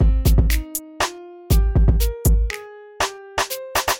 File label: Pop Hip Hop